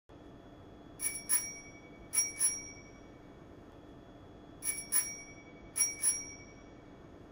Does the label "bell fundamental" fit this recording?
Yes